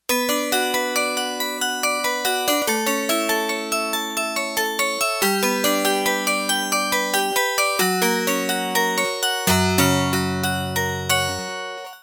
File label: Harpsi Wire; Yamaha TX802; Factory Patches; Voices